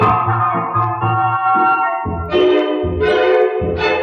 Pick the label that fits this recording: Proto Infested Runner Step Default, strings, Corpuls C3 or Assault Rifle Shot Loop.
strings